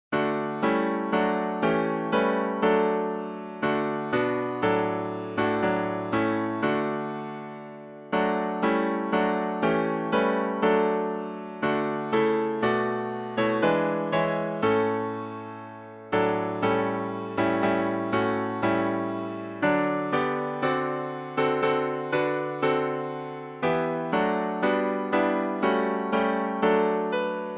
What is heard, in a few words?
Hymns